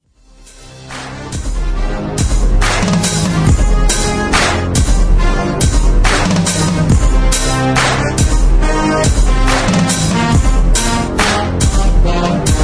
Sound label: dirty hip hop beat